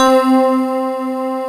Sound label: SYNT C4; Keys & Pads; AKAI S6000 CD-ROM - Ultimate